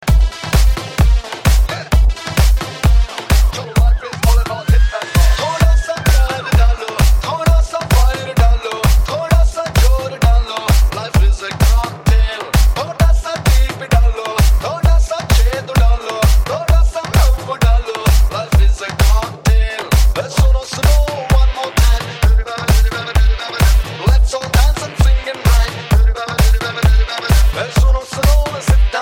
shambo remix, shiva ringtone; Navratri Special

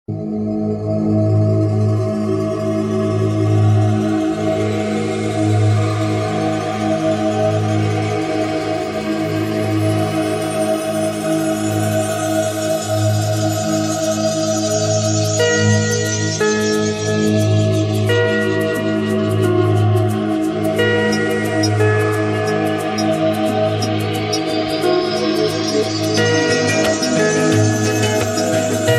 Ambient Chillout; Ringtones

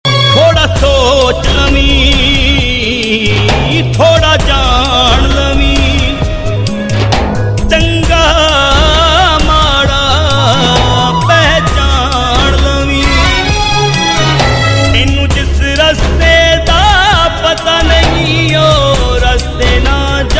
Punjabi Sad Tones; Ringtones

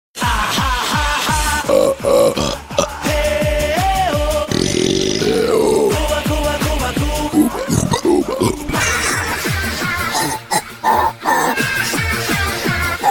haha oops oops

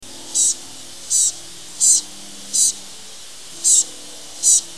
The sounds of birds

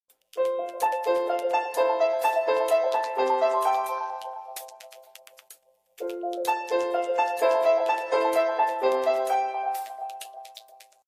Apple Iphone 8 Stock Ringtone; Iphone Ringtones

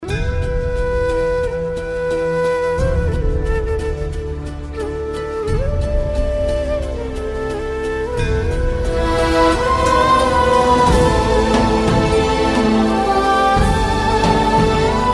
Urumi Sad Tone; Malayalam; Ringtones

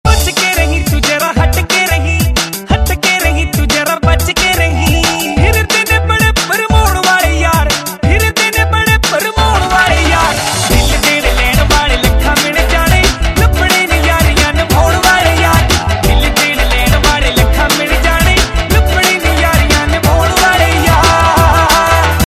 Punjabi Bhangra; Ringtones

